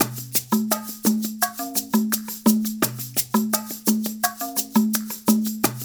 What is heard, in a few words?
loop, percussion